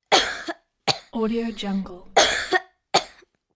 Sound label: girl cough